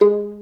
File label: Violin Pizz G#2; Instruments & Percussions; InVision Interactive; Lightware VOL-1